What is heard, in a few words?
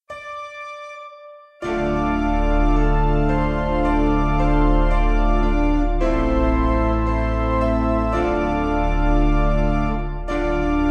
Piano